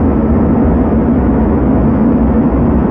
plane; Panzer General 3D

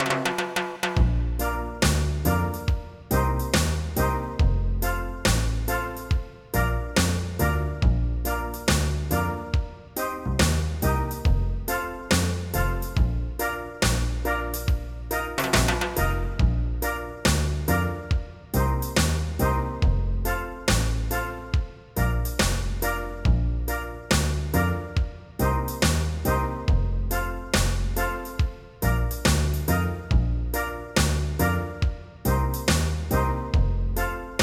Reggae; SuperModels - Exotic and Wild Fantasies

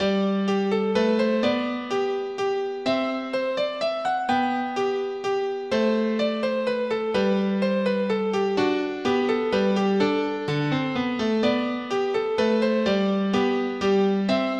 MINUET G

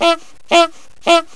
car horn

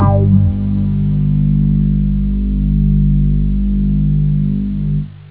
hi synth; OctaMED SoundStudio V1

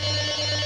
Radar Lock

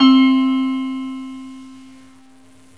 celesta; GMPlay; GMTones; Chromatic Percussion

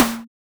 snare; Mistergenerator

